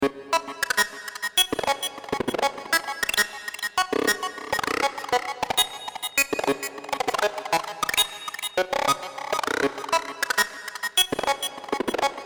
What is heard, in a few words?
stutter